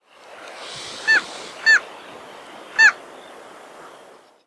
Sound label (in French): mouette tridactyle, chant